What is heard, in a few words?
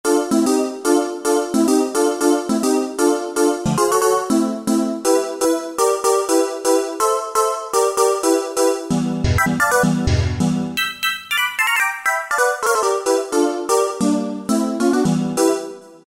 Ld-Minor Vocals, Yamaha EX5R; Factory presets